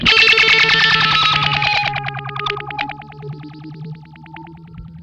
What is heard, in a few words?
ZAP FX, Funk Guitar; 90 s Sample CDs; Zero G